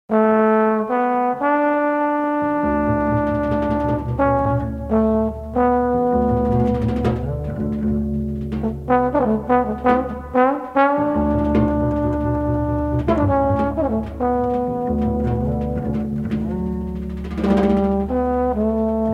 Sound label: Quintet - Three Jazz Moods